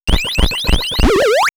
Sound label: Elec FX Computer Game; X-Static Goldmine